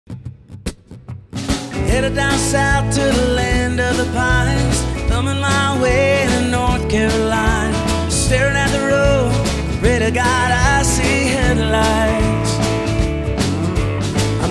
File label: Wedding Band